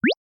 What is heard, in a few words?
Motion Pop